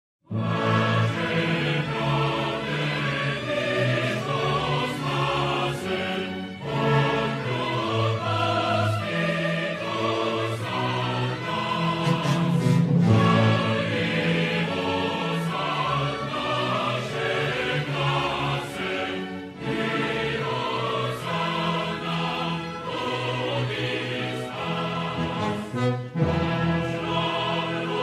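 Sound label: anthem of Serbia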